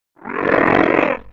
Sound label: die